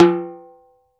TIMBA OP, TIMBALES; Cuba; AKAI S6000 CD-ROM - Volume 5

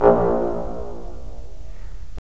BRS, Roland LA Composer

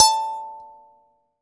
kalimba a, Thumb Piano; ambient; Chillout

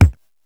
DRUMZ, ENSONIQ ESQ-1; Rubber Chicken Software Sample Bank

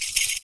FOOT PERC; Univers Sons - Raricussions

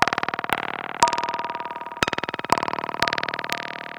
Modular Noise Rhythm (120BPM)

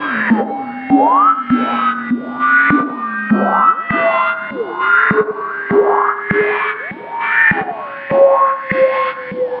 perc 100 wha, Percussive Loops 100 bpm; whawhy

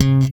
SLP D 4, bass; Spectrasonics; Bass Legends

